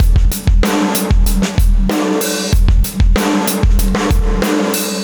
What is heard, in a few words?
Live Breaks, 95